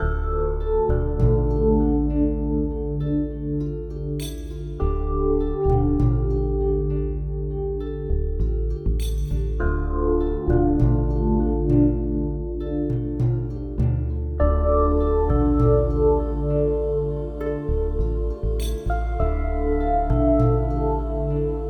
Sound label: Lasting Hope Loop